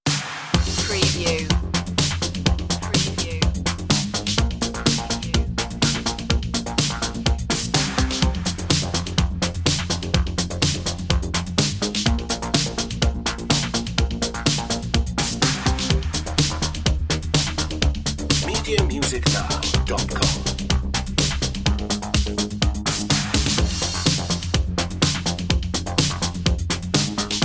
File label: 80s drum and bass